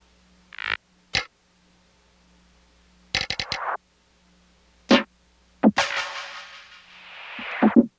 Drum Perc, 60

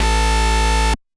Higher Layers Bass, A

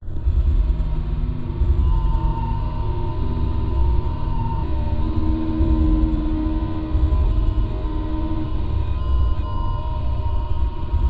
Interference, Am

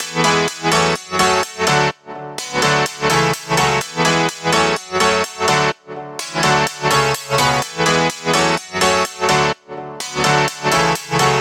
Pianos, 126 BPM; Ultra House; F-m

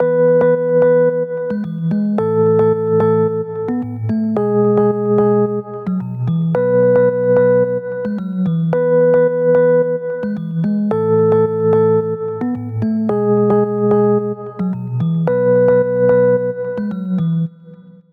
House Pianos 115 BPM E; Ultra

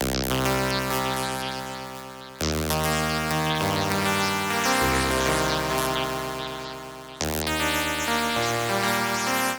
synth loop prophet08 arpeggio 100 C-D G; wasp

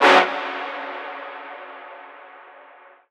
Synth Brass F-m

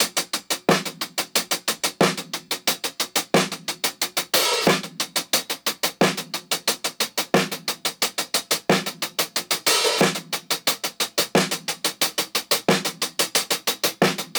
90 top loop 16ths hat snare taped

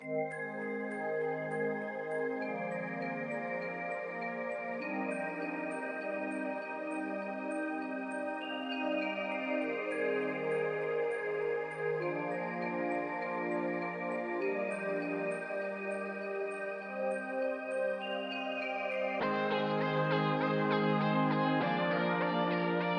100BPM, Cm, Major Loops; Solitude

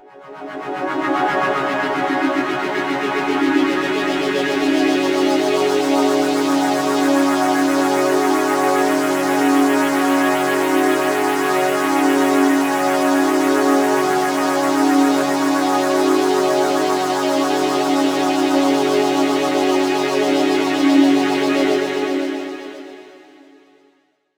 pad one shot shimmer C

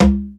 Memphis Conga

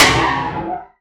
snare deep end

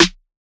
Snare

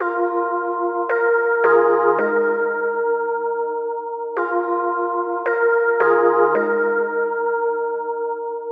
MELODY LOOP PIANO FM 110 BPM AMBIENT RNB

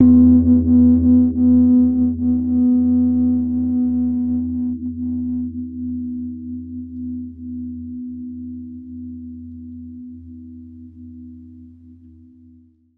texture one shot distorted church bell E

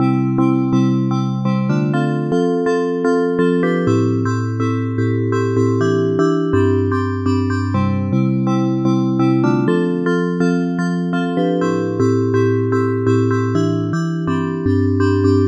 124 C Bell Viber

